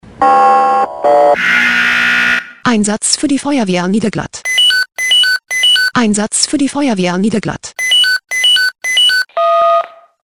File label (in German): SMS Alarmierungstone; FW Niederglatt